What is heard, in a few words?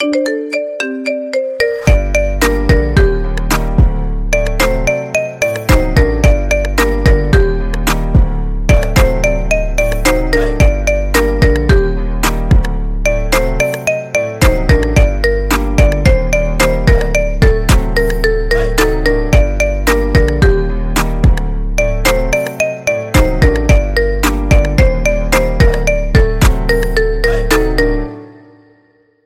iphone 14 marimba, ringetone